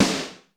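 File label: GATE SNARE; Crypton - DrumBase5000; GATE KITS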